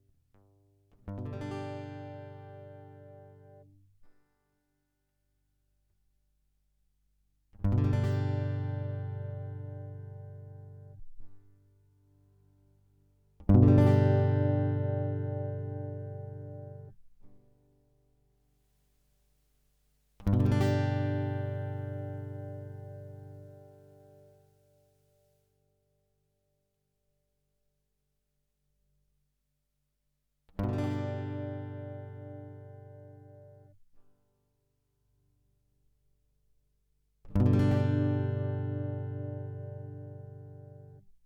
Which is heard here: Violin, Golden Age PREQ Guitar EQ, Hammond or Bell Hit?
Golden Age PREQ Guitar EQ